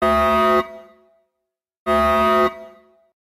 alarm; Golden Crayon Sounds

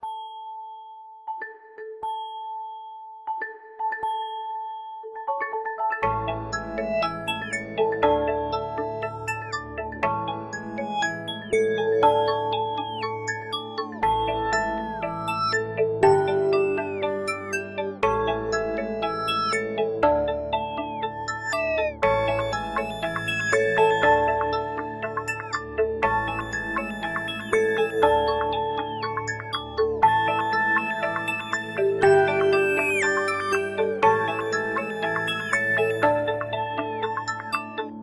Playful, Cisco Ringtones

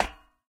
ladder step; Golden Crayon Sounds

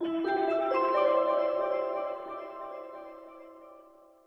broadcast; TTCom Soundpacks; supertt

